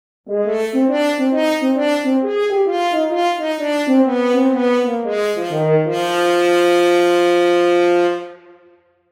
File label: Solo French Horn